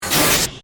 Robotic Door, Scifi; Robots (Sound Effects:Science Fiction); BlastWave

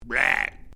Created Creature Small Call, Alien Sound Effects

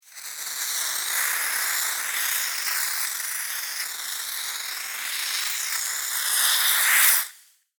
Blow Torch Flame, Blow Torch Cutting Flame Sizzle; Tech

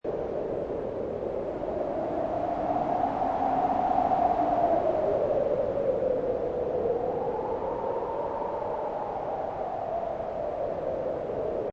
Wind Cold Loop Short, Icy, cold wind blowing; Seamlessly looping sound, short version; Weather, wind; Lynne Music

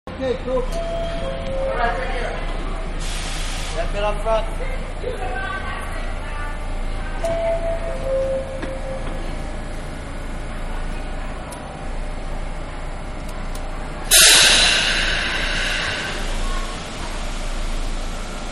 Subway-Departing Station-Platform View, Exterior; Subways; Manhattan Production Music

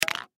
Mousse Can Knock, Mousse Can Knock Over; Hair Salon Barber Household; Primping; foley; Sound Effects:Human; SoundEffects-Blastwave

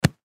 Impact Human Body Punch; Punches and Hits (Sound Effects:Combat); Blastwave

